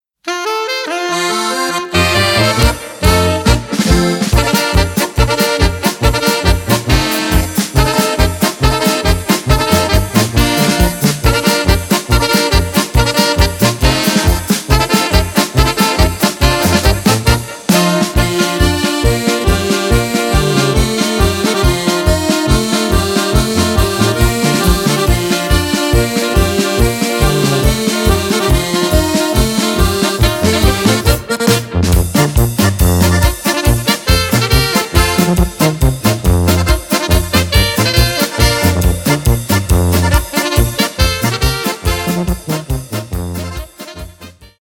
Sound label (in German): Trompetenecho